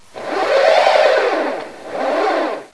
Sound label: tire spin; automoveis; batidas